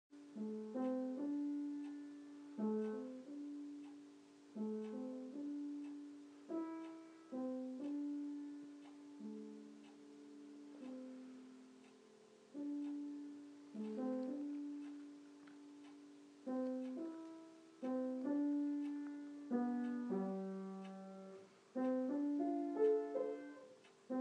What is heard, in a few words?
mom playing the piano